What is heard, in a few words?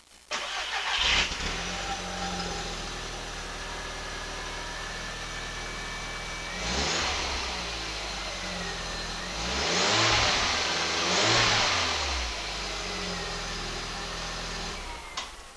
Stock Exhaust